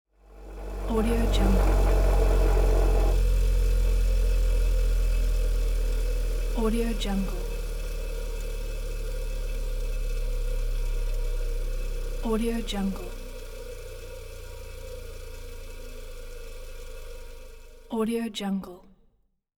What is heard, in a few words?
wood grinder turn off; cinematic designs